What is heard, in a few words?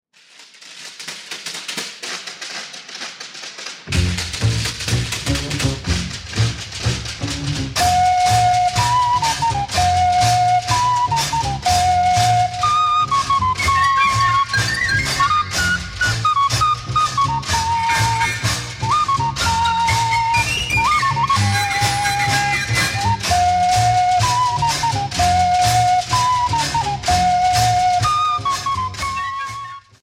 Drum, Dancehall; Drum Crazy